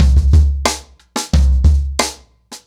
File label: 90BPM, ACOUSTIC DRUMS